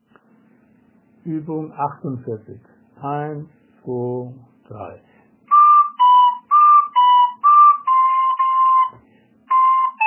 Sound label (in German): Flöten Übung